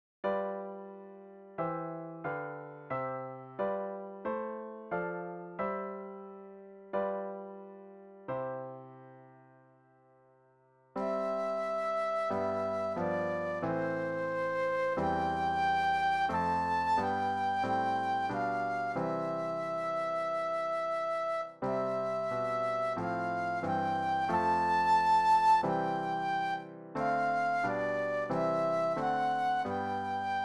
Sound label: Flute Solo